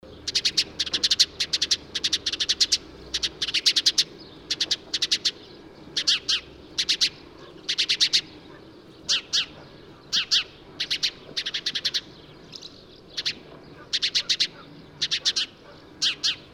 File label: Passer domesticus; SETE